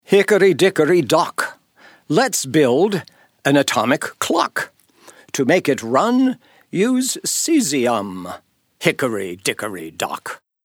Cesium Element Jingles

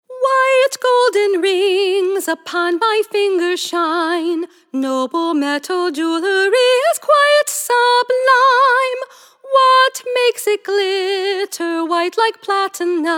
Element Jingles; Rhodium

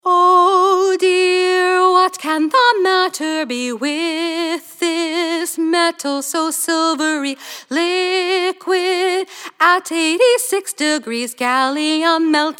Gallium Element Jingles

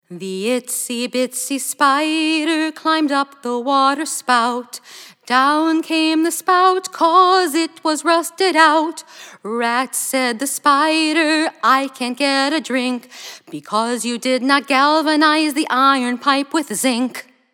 Zinc Element Jingles

